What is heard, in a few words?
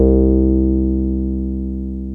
TB303 SQR, ROLAND TB-303 BASS